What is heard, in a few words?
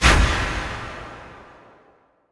scoreboard panel slide